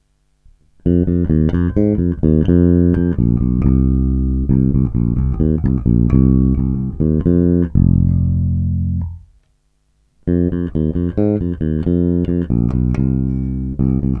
Oba snímače aktivně, Baskytara; Aleš Vychodil AVBS5

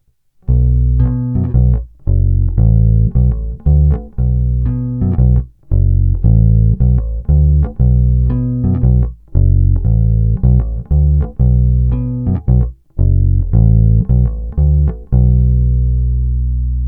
Hráno mezi krkem a snímačem FW, Lakland 44-64, Baskytara; Bob Glaub; hlazenkami (FW)

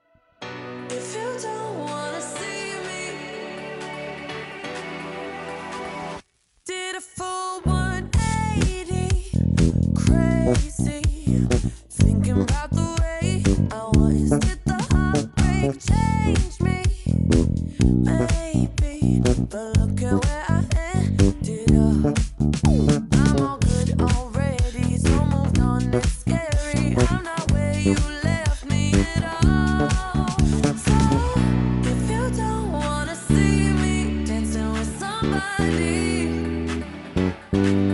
Ukazka 4 - bass mix, Music Man Sterling baskytara; Ernie Ball

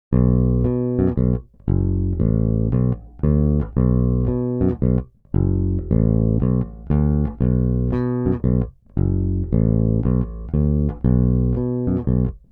Hra mezi snímačem a kobylkou; Fender Custom Shop Pino Palladino Signature Precision Bass

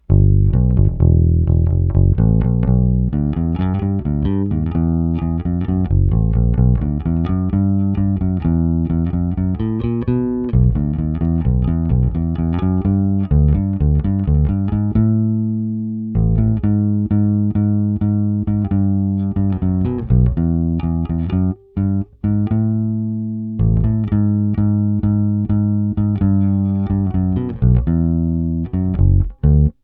Jay Turser Precision bass, Snímač Fender Pure Vintage 58 - La Bella Gold flats; JTB-400C-BK-A-U; Struny LaBella 760FGS-B Deep Talkin' Bass Gold Flats 5 Standard, 45-128, tónová clona otevřená

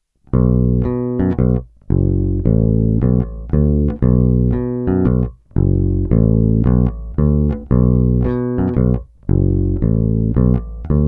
Hra nad snímačem, Baskytara

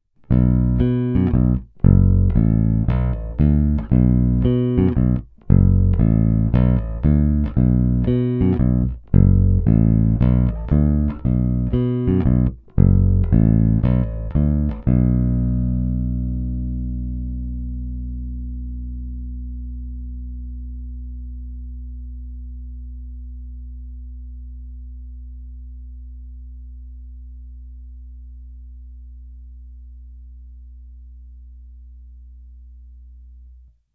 Cívka 2 – prostřední snímač; podobný charakter jako Fender Precision Bass '51; Ernie Ball Music Man BIG-AL

